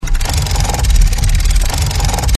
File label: box rotate; moneybank